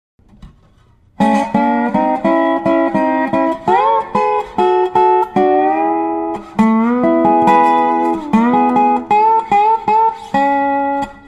dobro; island

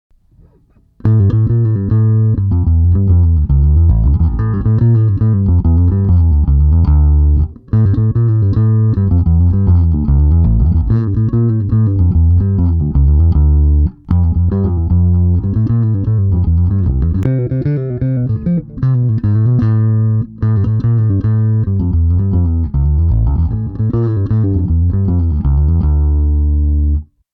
Bach Gavotte

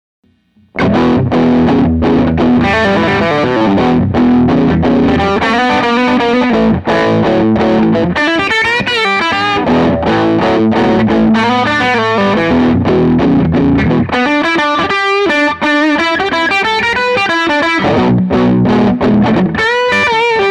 guitar boogie

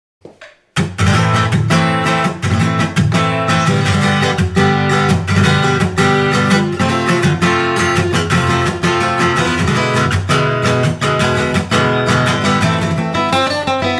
spanish fire

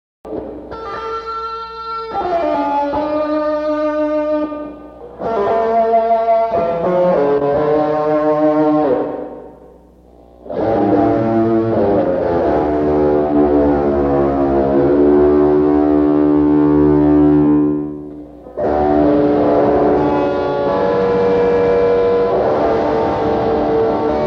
Toccata and Fugue

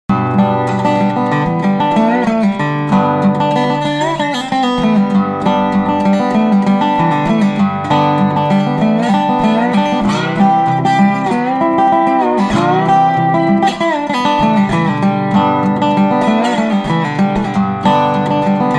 bluegrass jam

